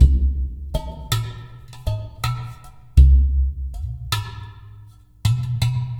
Udu

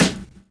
drum chop; AMG Gota Yashiki Groove Activator

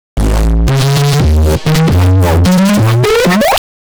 neuro riser

